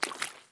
jump puddle; Escape from Tarkov

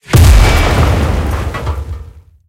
tank hit med; tanks; War Thunder

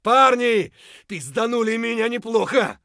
hurt heavy; Escape from Tarkov

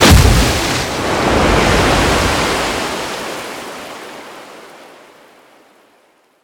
damage vehicle; World Of Warships

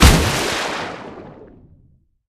torpedo; World Of Warships

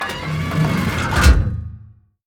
gun reload; World of Tanks

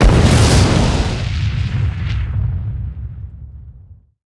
damage vehicle; World Of Warships

